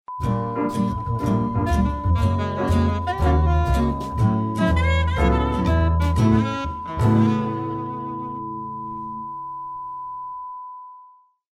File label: groovy jingles